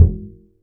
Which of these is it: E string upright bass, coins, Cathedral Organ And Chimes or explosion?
E string upright bass